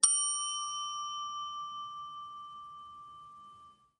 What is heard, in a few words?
bell